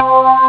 chord; ST-XX Amiga Soundtracker Sample Packs

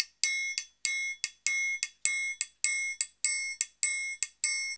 Modern Percussion Loops; Soundscan vol.56; AKAI

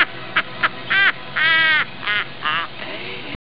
fright laugh; Amiga Soundtracker sample

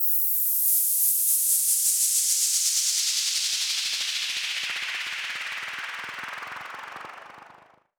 Downlifter; FX; 99Sounds; Monosounds

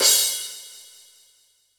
Crash Cymbal, Standard Kit, Yamaha PSR-E323

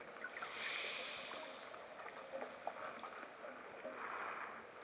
Cove Cave Light, Water

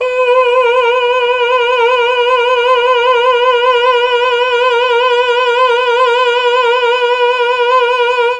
B3 CHAMB MA, Mellotron, MALE VC; Mike Pinder - Mellotron Archive CD; CHMB MALE VC

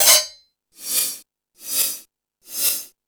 Dance 2000; E-MU Pro-Cussion

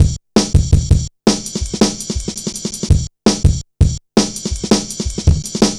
heavy ridez, drum loops; Zero-G Jungle Warfare 1, 2 & 3

